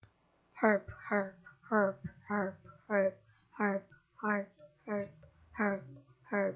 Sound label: harp